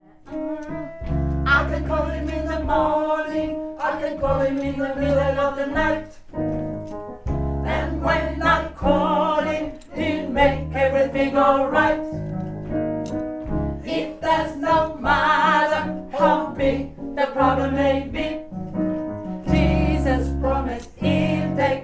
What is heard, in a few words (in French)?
alto